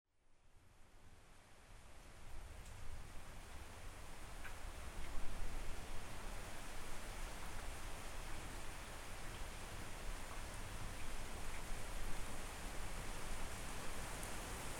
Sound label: Relaxing Rain and Loud Thunder, Free Field Recording of Nature Sounds